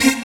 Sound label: dance chord